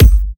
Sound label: Bassdrum